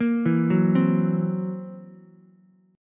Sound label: Fsharp 7th Flat 5th down Ukulele; arpeggiated; JGuitar